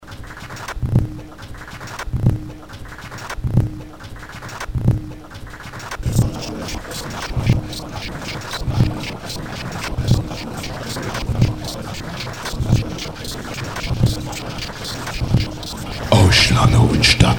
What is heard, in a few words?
Chorus